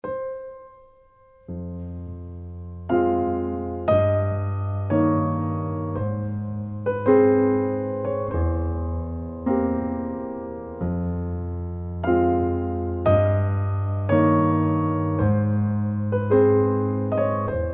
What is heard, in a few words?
nocturn f minor; Recorded at Studio Sound and More Warsaw, 3-5 December 2008; Sound engineer - Tadeusz Mieczkowski